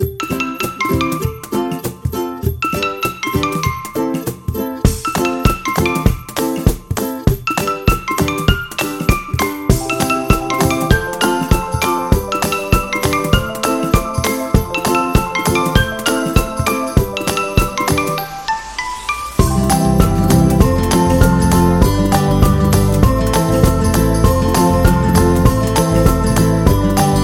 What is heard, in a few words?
Hawaii Ukulele; sounds of the world